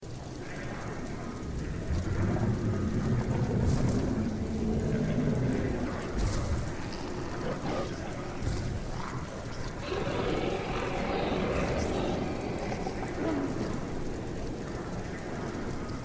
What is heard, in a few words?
Orcs Camp Amb; shieldwall chronicles swords of the north